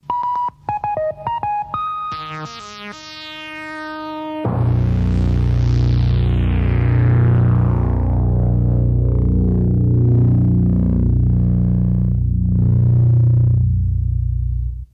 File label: Electro Beeps, radio style ads intros sweeps & jingles